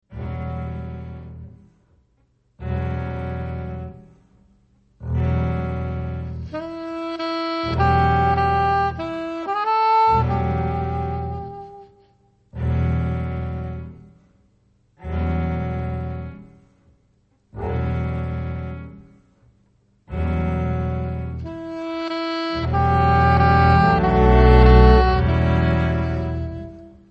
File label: Strings